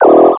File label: reload